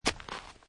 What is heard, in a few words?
Snow And Ice Put Object Into Hard Snow; Weather